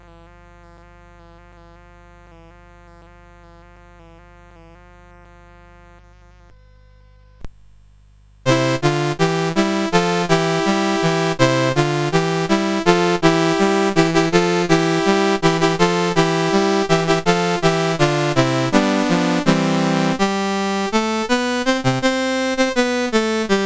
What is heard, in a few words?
Hallelujah Chorus, CSG8580R5